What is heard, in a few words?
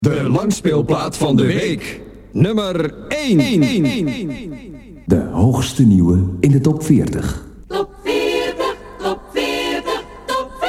Jingles; Veronica